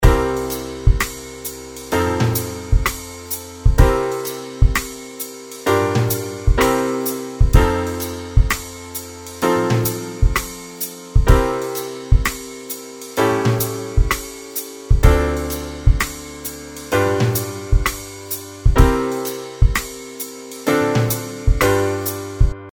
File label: blues A7